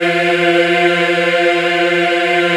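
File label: F3 men women eh, Choir; USB Soundscan vol.28; AKAI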